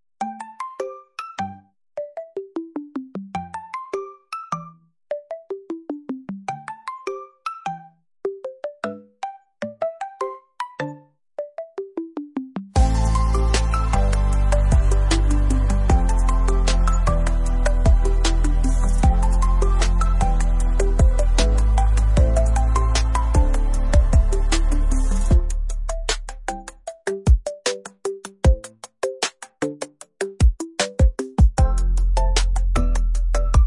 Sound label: Soft wind ai ringtone